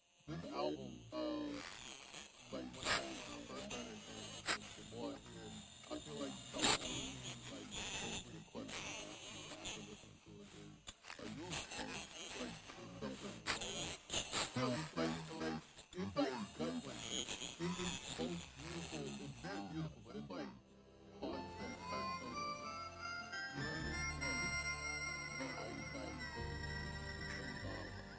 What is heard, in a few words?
Underwater Interlude